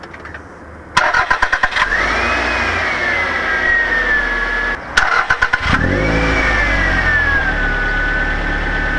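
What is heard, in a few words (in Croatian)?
paljenje, Toyota MR2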